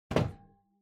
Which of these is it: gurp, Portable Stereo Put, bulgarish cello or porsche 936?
Portable Stereo Put